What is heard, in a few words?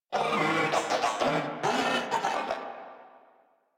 Vocal Idle, Enemies; Warframe; Moa